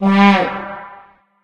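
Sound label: vuvuzela; video games; Source Engine; tf; football manager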